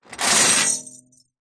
keys large bunch prison guard pick up from metal surface hollow